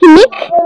bass

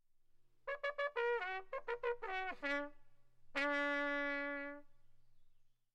bugle tune

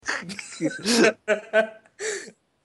laff

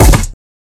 gunshot

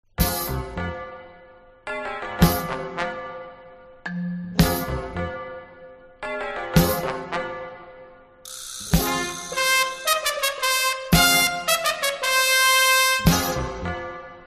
piccolo bell theme

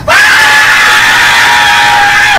dad screaming

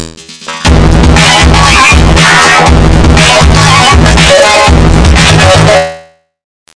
techno; fortnite

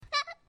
toot

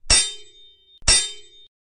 swords clashing; sword hit; soundbible

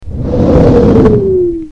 mortar incoming

